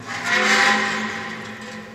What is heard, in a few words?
Scrape Metal Shrt Am, metal groan atmos